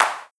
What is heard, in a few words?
Clap Short